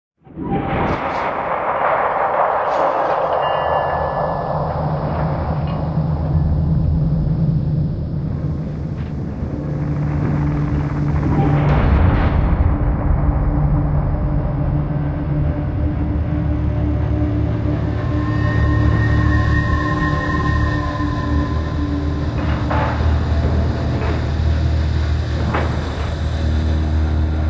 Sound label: Shop Tension; Mad Max